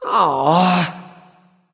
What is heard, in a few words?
nv sigh; smite; voicepack; xbalanque